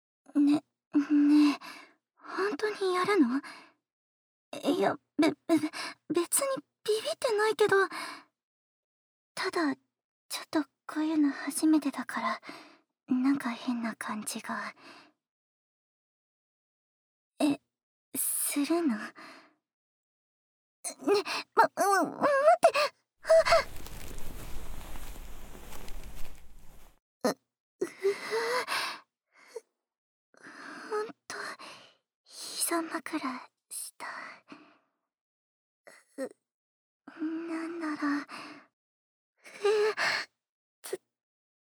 初心者耳かき（右耳）, ASMR

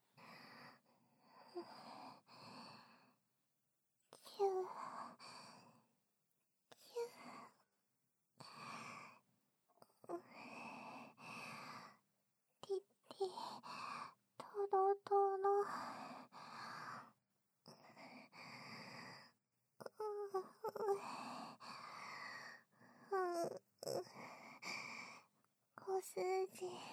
【愛撫】首・胸をペロペロ、逆耳舐め、手マン; 奴隷少女ティティとのあまあま共依存

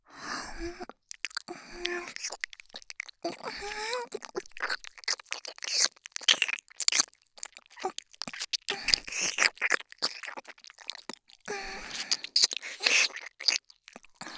耳舐め; 赤ちゃん作ろ♪; ハーレムオナサポ 4人のメイドがご主人様のオナニーをお手伝いするバイノーラル～文、千曜、名月、莉桜～

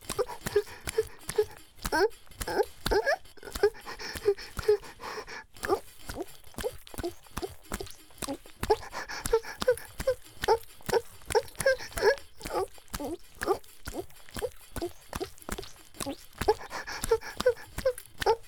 ギシアンループ; 田舎の幼馴染はからかい上手

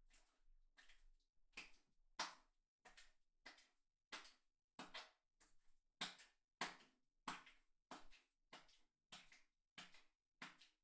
ピストン 中速; KU100; アダルトな音

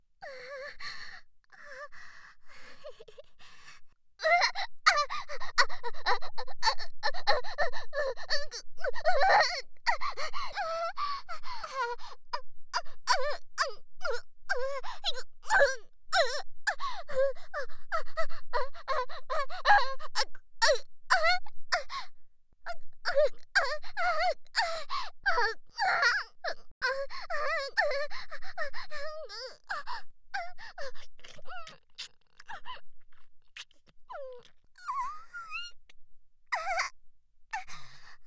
イキまくり挿入喘ぎ

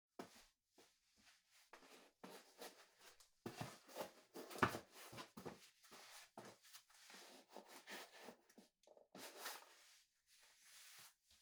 スニーカーを脱ぐ; 3DIO; 色々な動作

